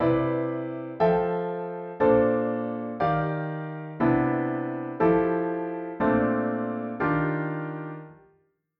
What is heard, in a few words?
Jazz Chords Major 9; Jazz Chords Made Easy